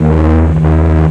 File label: portal; dactylsp